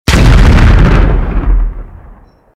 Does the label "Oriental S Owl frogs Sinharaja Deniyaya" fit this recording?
No